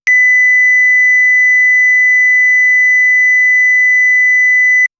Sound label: bluetooth speaker power; devices